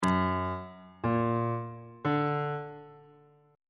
f sharp augmented triad chord

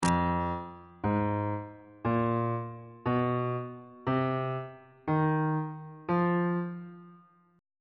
f blues scale on bass clef

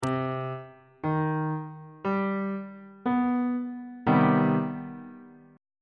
d double flat minor major 7th chord on bass clef

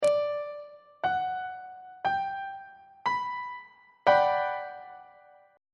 a double flat major 7th 2nd inversion; treble clef

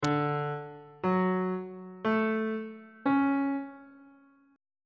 d ionian 7th chord 1 is d major 7th on bass clef

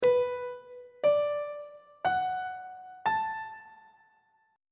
e minor 7th chord 5 is b minor 7th on treble clef

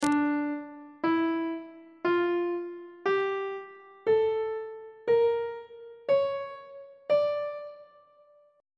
c double sharp harmonic minor scale; on treble clef

